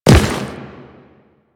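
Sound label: step on rock, footsteps; Warframe; The New War; Kahl; Quests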